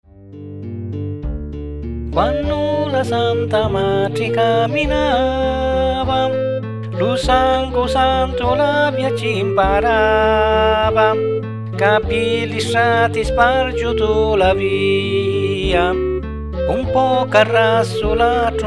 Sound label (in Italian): vocestru, canzoni religiose; la passioni di dristo